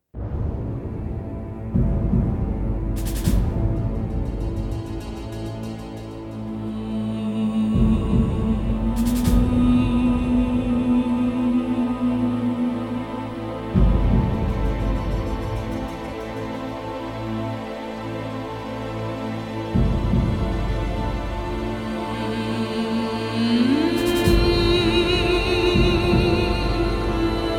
Trance

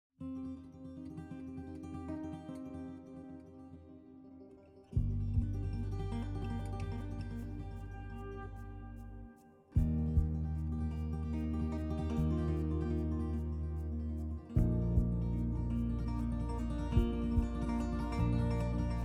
Country Drive

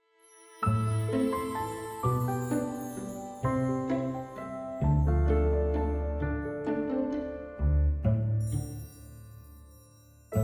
Historic Old Town Waltz

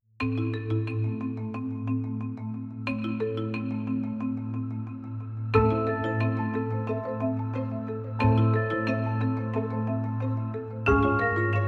Marimba; Lichtinstallation

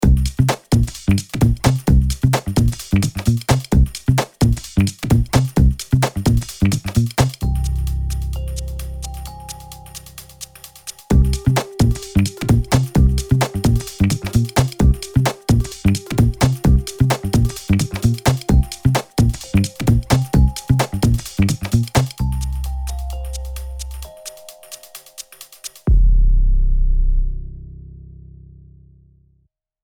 Funky Bed variation two

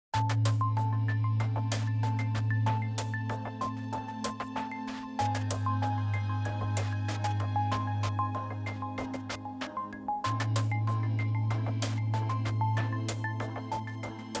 Hidden Worlds 95bpm

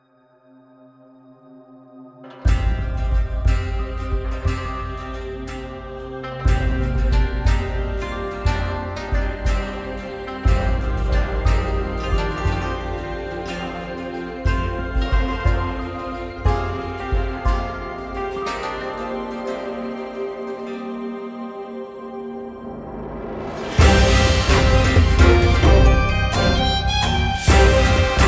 Tribal Dance

